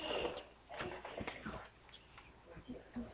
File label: groan